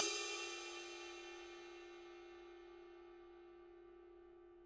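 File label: cymbal 18 brush; tryThis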